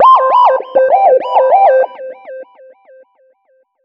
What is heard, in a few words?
Alarm; сирена